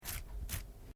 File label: MALE SCRATCH ARM; Miscellaneous Human (Sound Effects:Human)